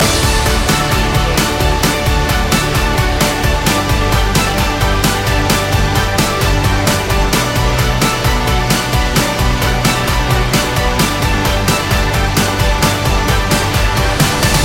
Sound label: sports hero loop